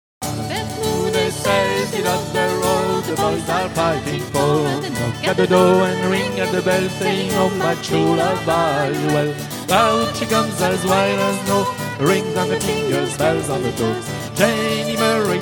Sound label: SONGS